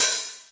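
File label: Yamaha RS7000 hi-hat cymbal Gb2; drum machine; Yamaha RS7000 Cymbals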